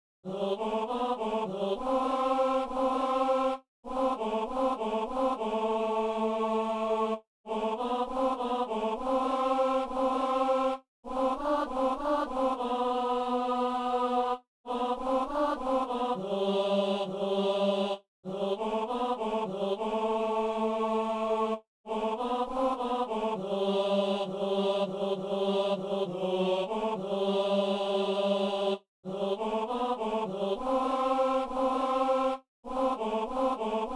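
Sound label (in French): ténor; notre dieu s est fait homme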